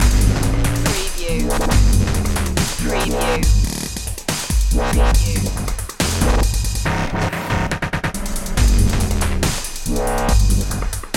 ice ragga

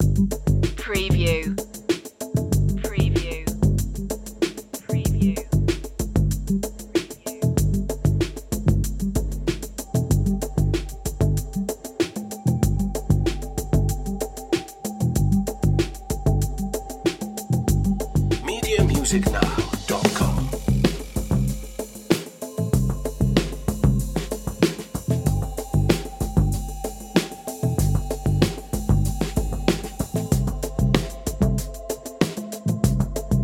feel good island loop

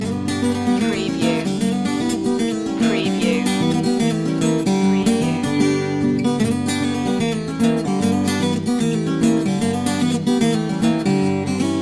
rag loop

